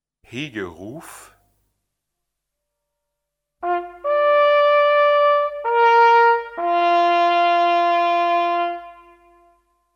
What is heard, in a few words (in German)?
Hegeruf, Jagdliche Praxis